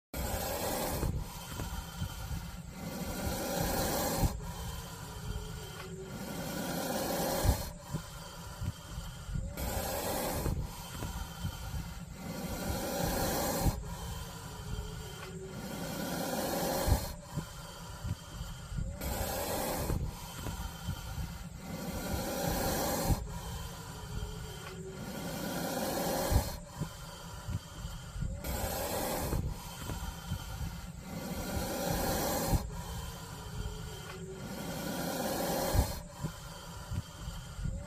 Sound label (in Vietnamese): tiếng rắn hổ mang kêu